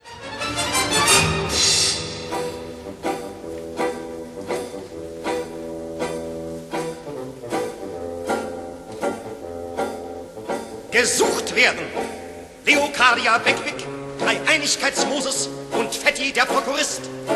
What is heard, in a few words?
opening banjo; Weill; Mahagonny